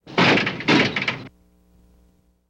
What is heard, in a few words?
Car Bottoms Out 2 Hits, skids autos